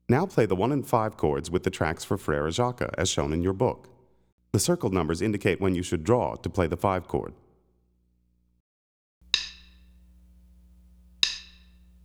Frere Jacques, harmonica; Play Harmonica

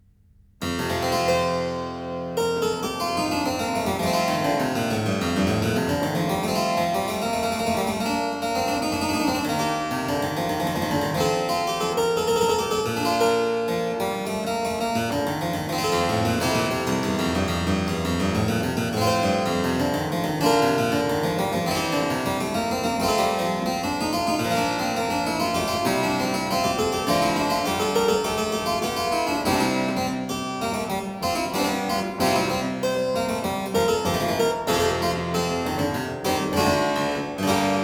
Preludium, harpsichord; Byrd; BK116 [EKM 4]

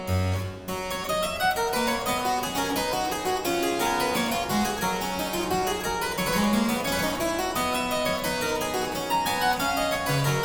Aria Variations in G Major, Harpsichord

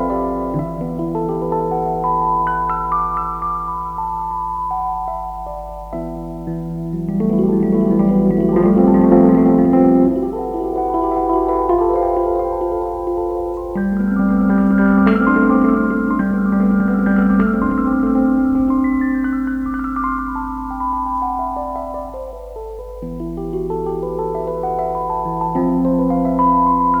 Piano Solo

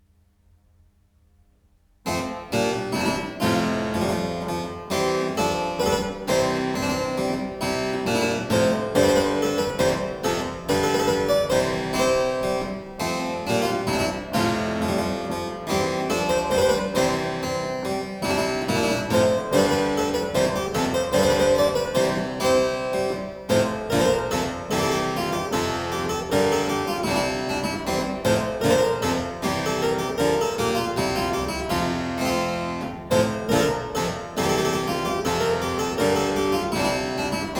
La Volta (harpsichord); Byrd; L. Morley, BK90

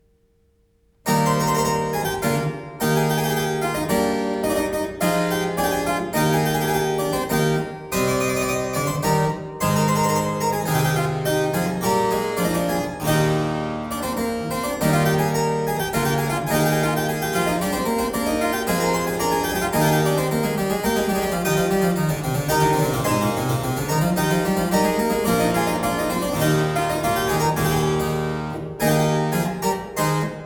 Galiarda (harpsichord); Byrd

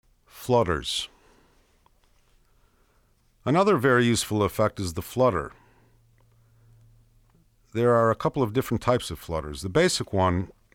Flutters, Blues Harmonica; Special Effects; Blues Harmonica Masterclass

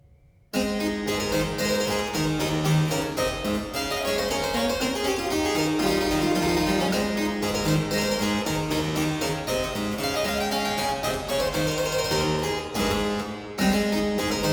Hornpipe; Brilliant Classics; 20 keyboard pieces (ed.Davitt Moroney)

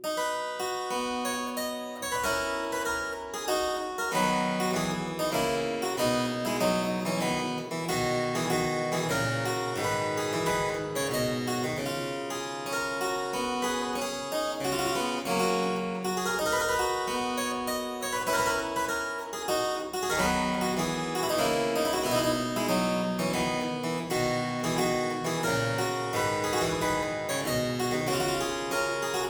Aria in C major Variatio 4, Harpsichord

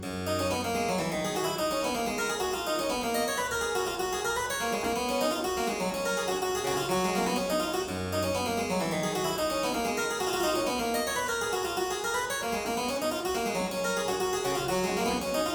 Aria in G major Partita 23, Harpsichord

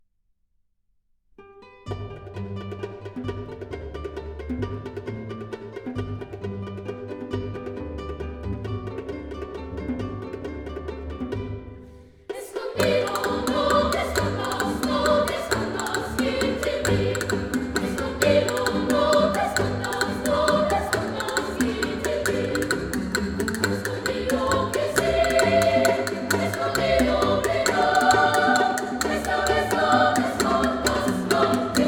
Folk Songs; Escondido (Argentina)

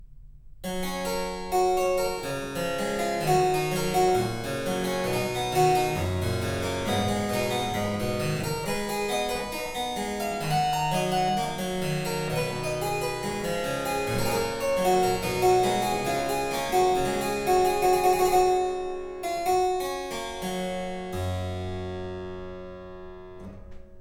Suite No.1 In G Major Prelude, Harpsichord; Purcell edition

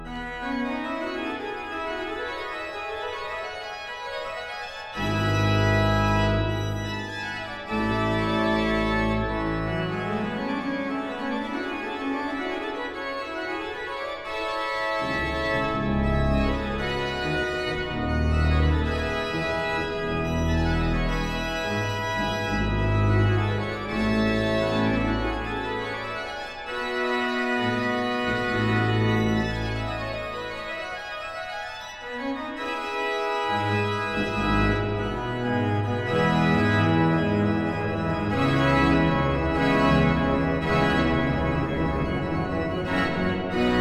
Toccata in E major, Organ; Bach; Christopher Herrick; The Complete Organ Music; BWV566

